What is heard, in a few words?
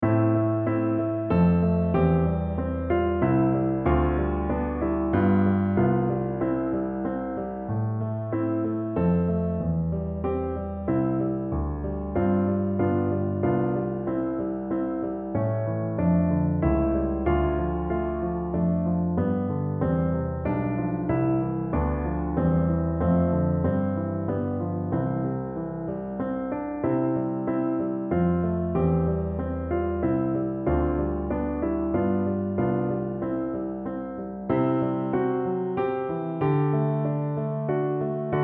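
sebben crudele, a minor, accompaniment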